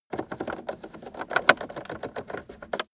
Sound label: car temperature knob turn long fast